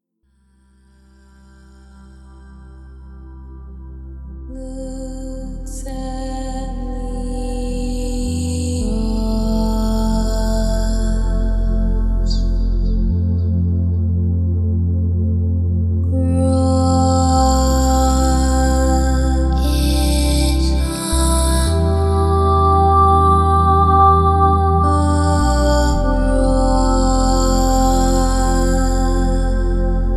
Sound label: Timeless Vocal Mix, Celtic